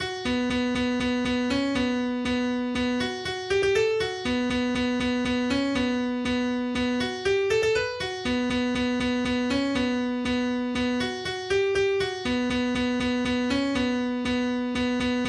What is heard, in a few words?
Techno